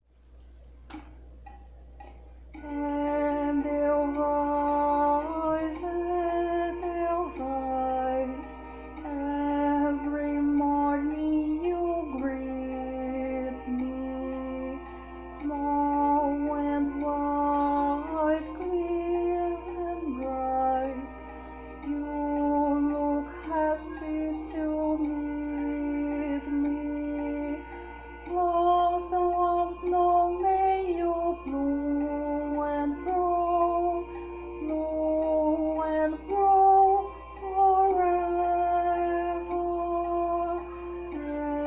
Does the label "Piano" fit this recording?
No